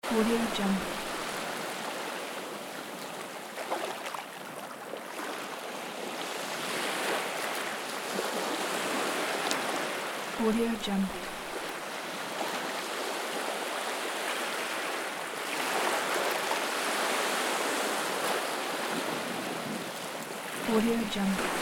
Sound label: beach